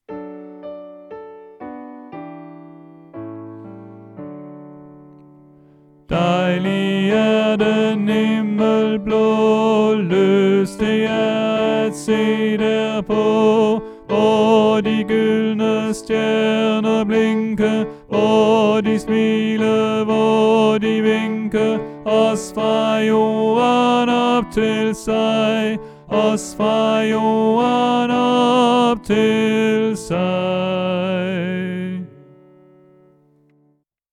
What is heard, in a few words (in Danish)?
Tenor; oevefiler